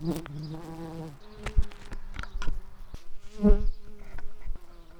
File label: Lucorum workers, bumblebees